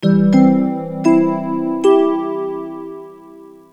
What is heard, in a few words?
gong